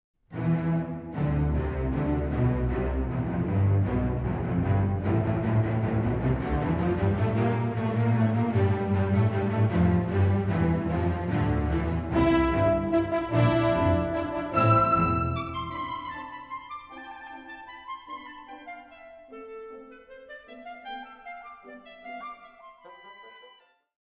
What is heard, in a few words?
Landler